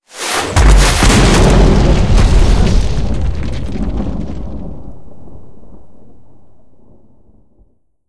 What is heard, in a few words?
overpass jets